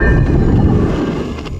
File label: SLIDER Swipe Drag Movement Stone; GameBurp; 2000 Game SFX